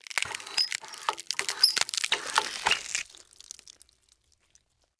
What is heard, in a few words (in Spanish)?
LACERARSE BRAZO